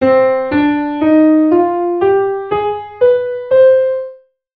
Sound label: Diatonic Harmonic Minor Scale